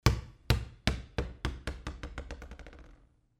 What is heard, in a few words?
ball drop